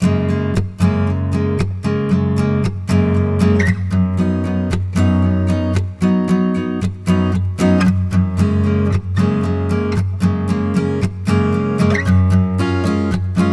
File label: Straight Talk (no pads)